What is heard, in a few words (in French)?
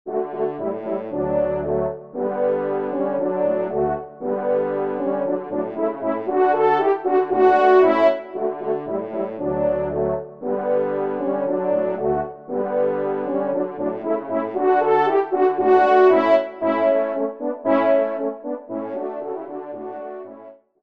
Trio Cors; Jean-Joseph Kenn (1757-1840); Trio de Cors ou de Trompes de chasse